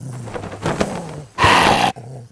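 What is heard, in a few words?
Leviathan attack; Seer; GamigoNA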